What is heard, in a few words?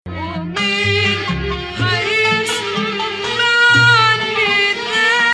2nd Rast, maqam